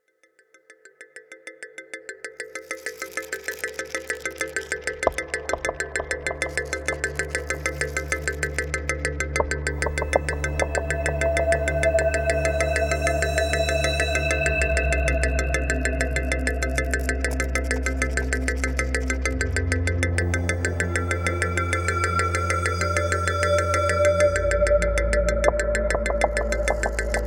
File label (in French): jingle